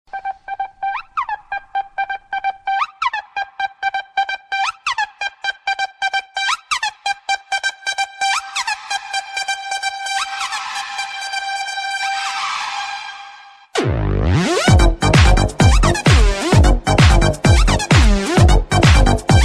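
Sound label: electro ringtone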